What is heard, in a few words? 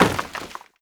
break cardboard box small; physics; breaking